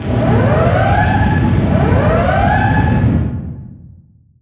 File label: distance ship destroyer damaged defending; Hearts of Iron IV Death or Dishonor